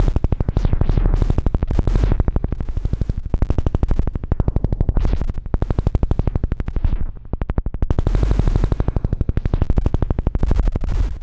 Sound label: kick explorations